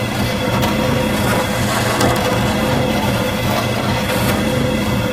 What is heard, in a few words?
wood solid scrape rough loop